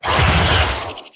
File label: Pain Light; Evolve; Wraith